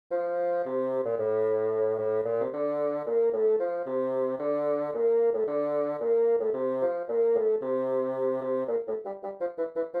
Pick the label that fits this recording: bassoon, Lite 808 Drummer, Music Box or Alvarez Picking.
bassoon